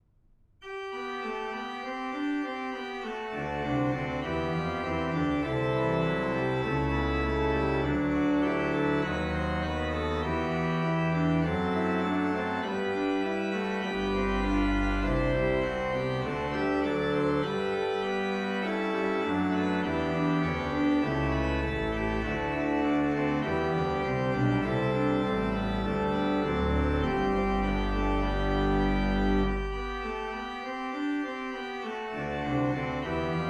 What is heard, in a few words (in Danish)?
orgelkoral; et trofast hjerte